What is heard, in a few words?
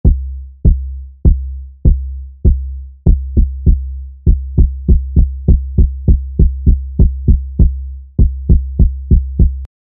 ba boom heartbeat